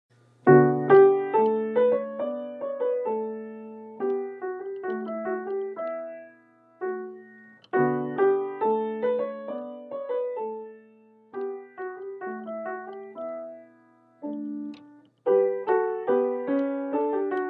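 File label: Peters song, Piano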